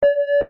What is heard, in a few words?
beep